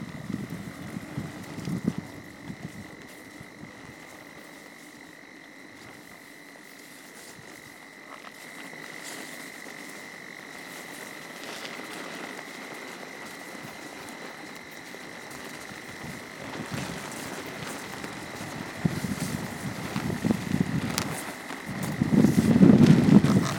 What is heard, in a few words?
Hong Kong Mui Wo on a village bridge listening to the frogs under the flapping New Year